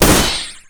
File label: glaive melee hit